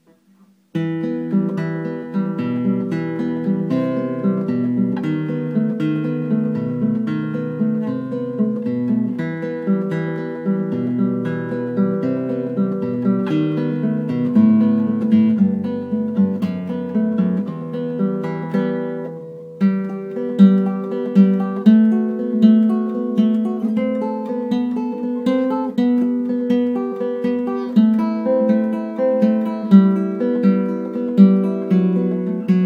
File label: Milonga